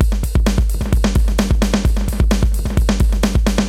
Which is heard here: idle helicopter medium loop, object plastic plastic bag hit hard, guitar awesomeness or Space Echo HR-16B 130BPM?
Space Echo HR-16B 130BPM